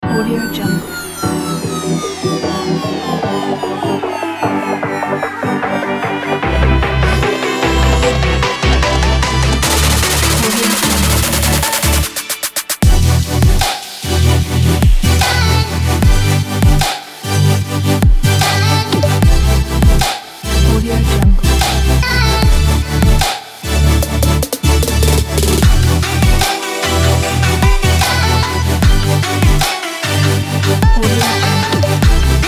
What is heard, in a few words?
future bass